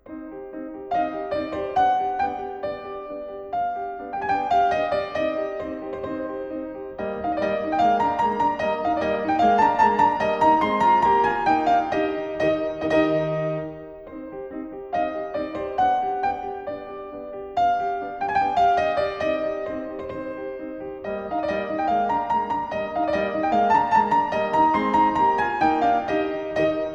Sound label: piano; 9 Variations on March of Dressler WoO 63 - Var. 1; Ludwig van Beethoven - Complete Works [Brilliant Classics 100 CD Box]